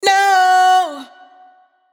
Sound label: riff no Em wet, Vocal; Slate Digital Omnivox Vocal Sample Pack